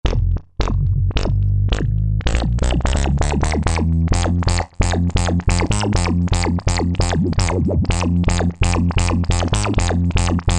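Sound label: Bad Acid Bass, Roland SR-JV80-09 Session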